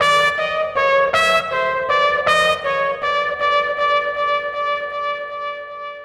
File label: Trumpet Echo; Keys; Horns; Drum & Bass